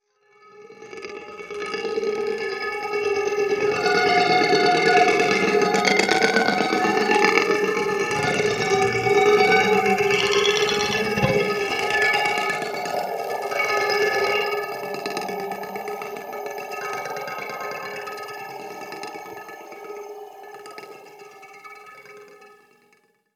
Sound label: drill gtr; Polyend